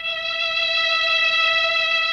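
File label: MELLOSTR, Mellotron Violins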